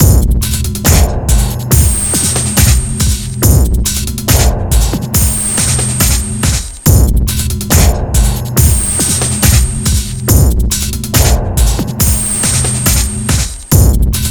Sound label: beat couplet